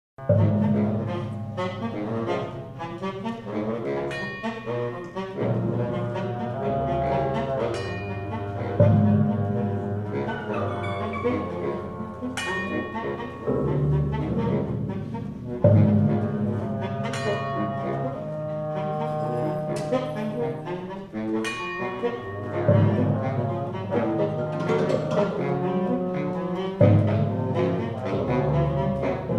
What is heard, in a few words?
sax perc ophecleide